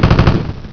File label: gatling gun; Pro Android Games; Wolf3D